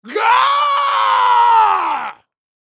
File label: Horror Scary Human Male Caveman Painful Scream